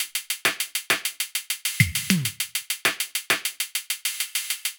Dancehall 100 bpm, Drums; Loops; Top; Argus; Ableton Live 12 Lite; Core Library

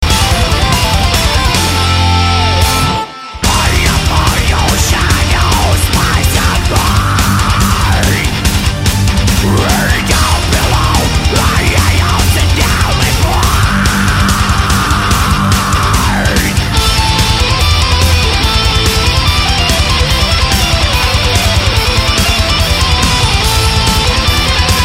ringtone

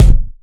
Kick Skin; One Shots; Drums

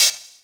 Hihat Closed

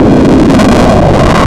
Noise Modulated; Kodiak; Ableton Live 12 Lite; Vector; Sprites

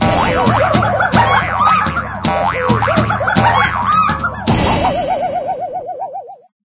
drink banana; There; ThereClient

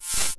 collision color; Audiosurf; circuits

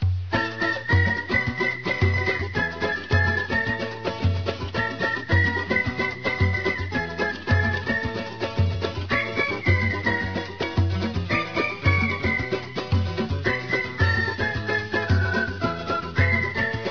samba; Active Worlds

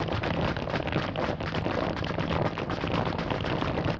crowd running; background

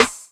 Snare, drums; Ableton Live 12 Lite Core Library

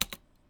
spacebar, Keyboard Sounds, Mechanical Blue; XSOverlay

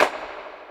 Clap, Drums; Ableton Live 12 Lite; Core Library

